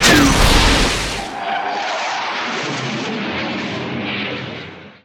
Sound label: call down cast; weapons; dota; gyrocopter